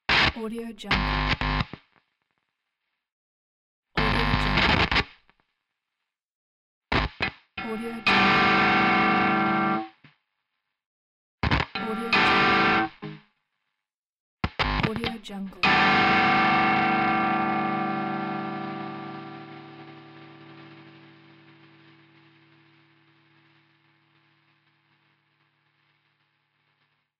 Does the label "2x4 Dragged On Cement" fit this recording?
No